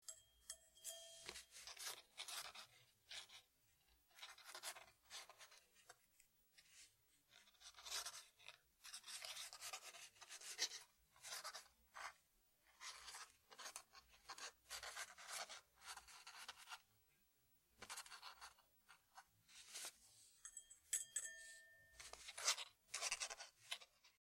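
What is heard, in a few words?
quill pen and inkwell sounds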